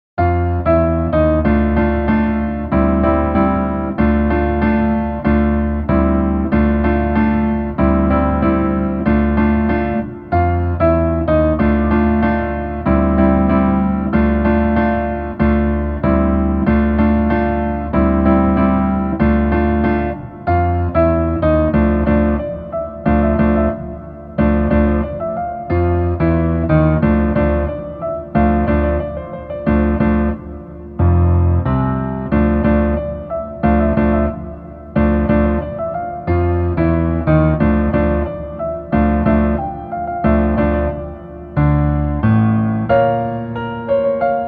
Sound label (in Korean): Piano ver, 멜로디MR, -1키